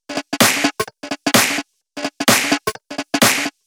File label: 128 LP Full Top